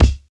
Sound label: raw kick drum c sharp key